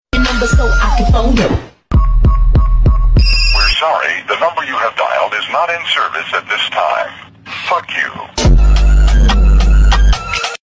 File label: english ringtone